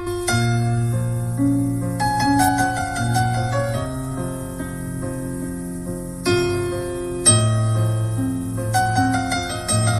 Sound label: Piano